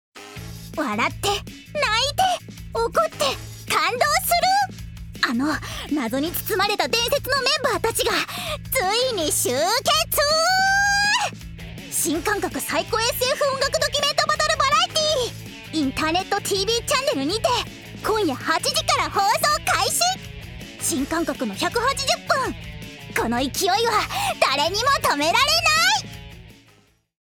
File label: BGM